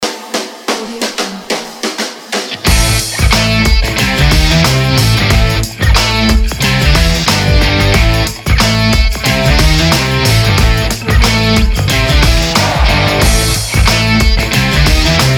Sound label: energetic rock; audiomechanica